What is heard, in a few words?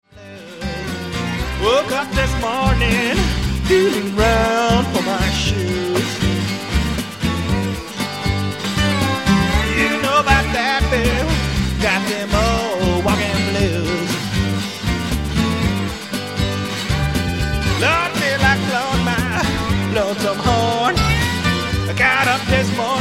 Walking Blues